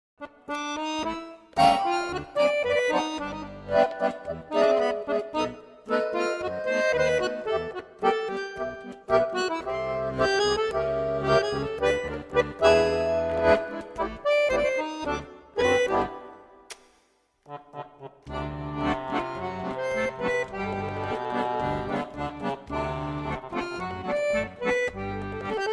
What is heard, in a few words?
accordion; zzmusic